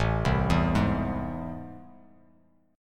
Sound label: A Minor Major 7th A down, Bass; arpeggiated; AmM7; Bass Standard; JGuitar